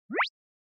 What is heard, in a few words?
Anime Motion Single